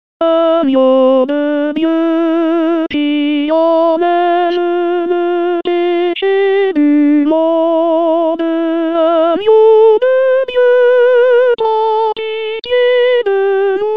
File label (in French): Agneau De Dieu Soprano, Chorale